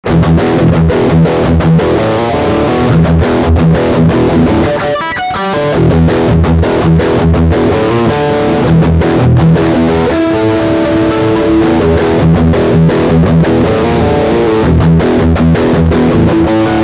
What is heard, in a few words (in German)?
Riff Humbucker Steg